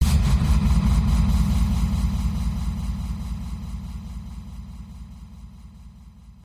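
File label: Soft Noise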